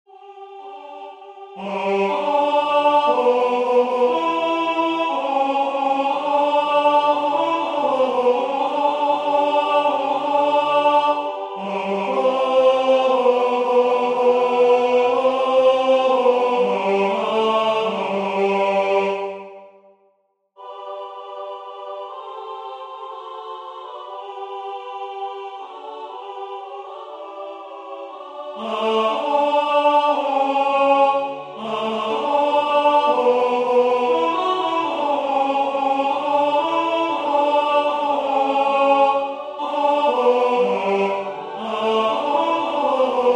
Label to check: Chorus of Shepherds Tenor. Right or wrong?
Right